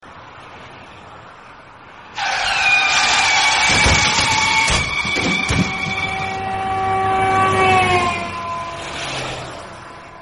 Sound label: car crash